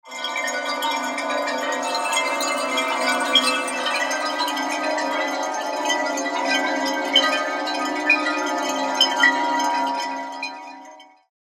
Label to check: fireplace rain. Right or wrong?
Right